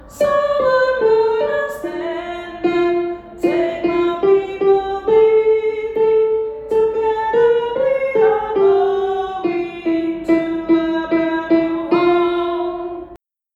Tenors - compassos 48...52 (so I´m gonna stand up); veu

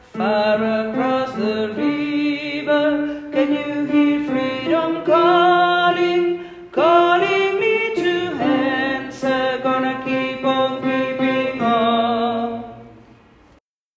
Baixos - compassos 52...56 (far across the river); veu

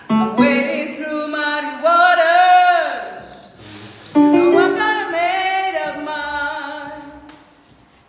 Contralts - compassos 40...43 (); veu

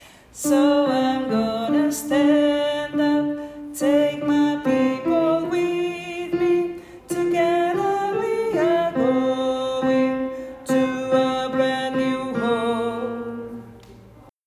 Baixos - compassos 48...52 (so I´m gonna stand up); veu

